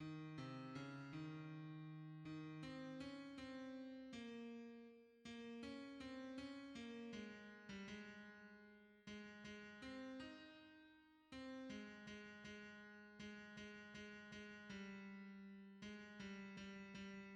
tenor; Dans peu de temps; hymns

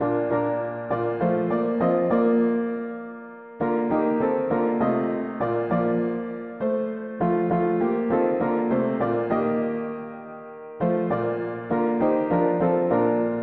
mono-piano, hymns; On earth the song begins

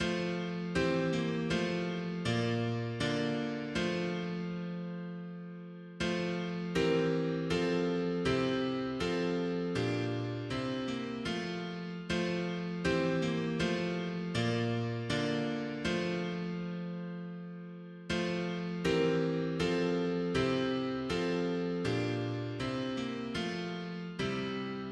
mono piano, hymns; Les brebis de Jesus

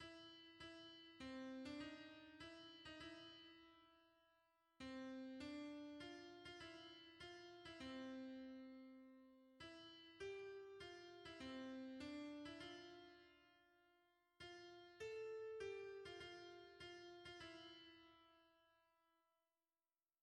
hymns, alto

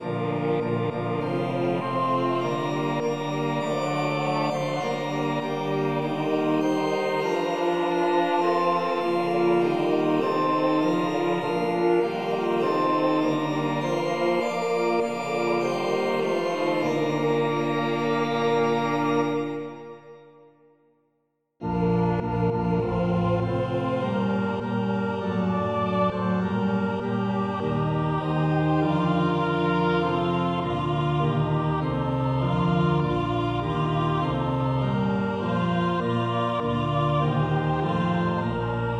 polyinstru, hymns

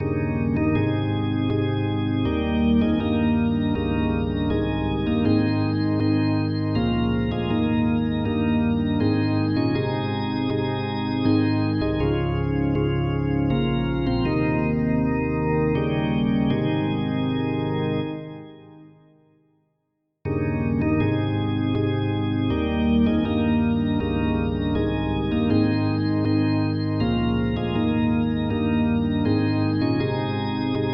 Praise the Saviour ye who know Him polyinstru; hymns

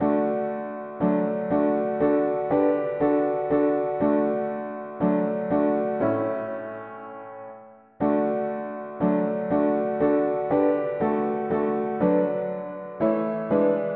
mono-piano; Romps-nous le pain de vie; hymns

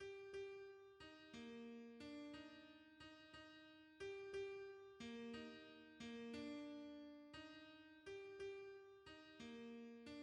alto, hymns; O vous qui n avez pas la paix

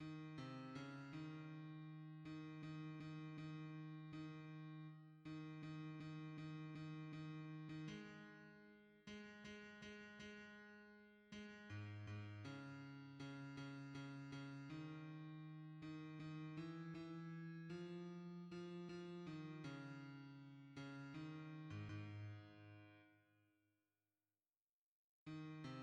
bass; Dans peu de temps; hymns

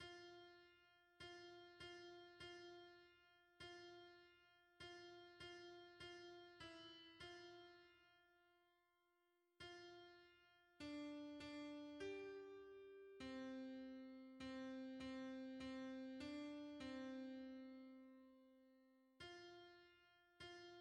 alto; Combien il est bon notre Ami divin; hymns

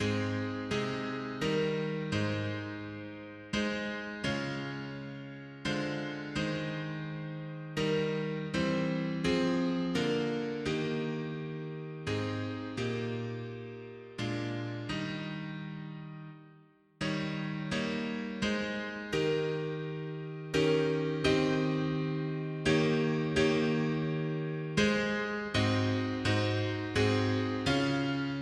mono piano, hymns; Jesus Fils bien aime

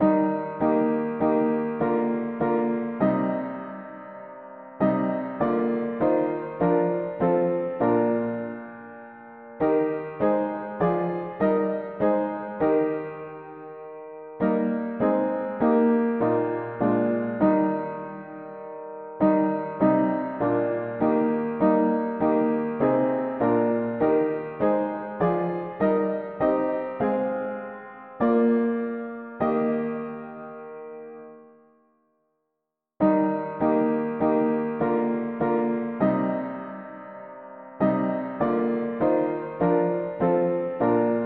mono-piano, hymns; The atoning work is done

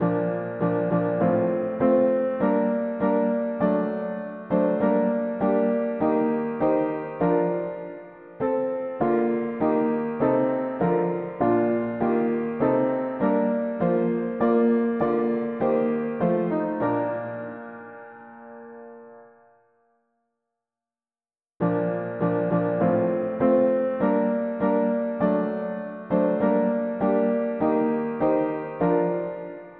mono piano, hymns; Come saints your grateful voices raise

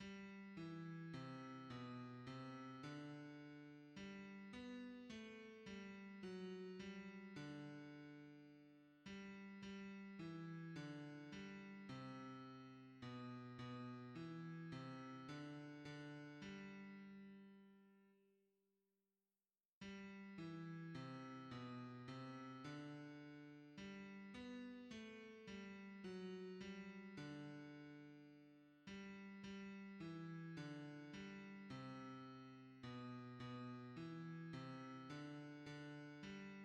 bass; Jesus ton nom rappelle; hymns

